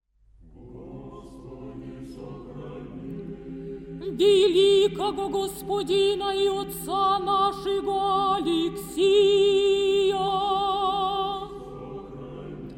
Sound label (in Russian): Архиерейский хор Многолетие на отпусте; Архиерейский хор Костромской епархии; Касторский